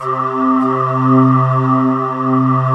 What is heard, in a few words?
DEEP BRTH, BREATHY; Synth